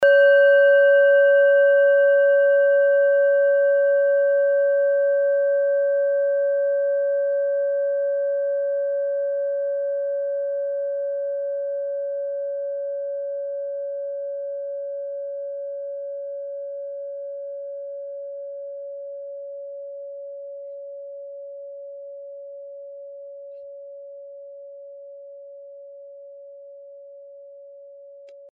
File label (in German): kleine klangschale; Diese Klangschale ist eine Handarbeit